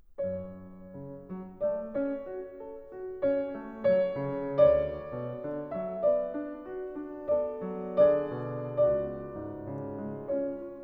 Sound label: Andante espressivo Staccato e leggiero; Schumann